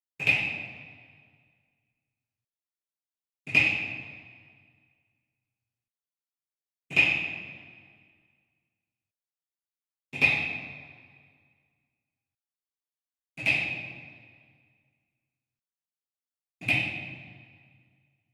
WOOD PERCUSSION Wood Block Japanese Resonant Flam Far; boom library; Cinematic Strikes Construction Kit